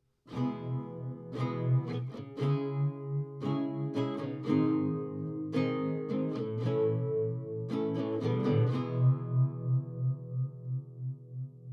live Guitar; microphone Recorder

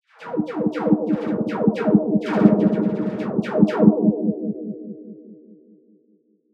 snare

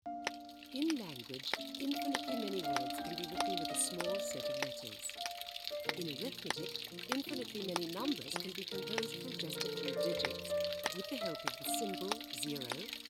mix dry reference

